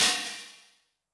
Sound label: open hat MOD Tune, 909; classic machines; 909mod; OH - OPEN HAT